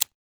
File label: Dry Shot; Redmatch 2